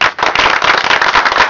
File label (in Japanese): kansei, cheer; Nintendo; July 2020 Gigaleak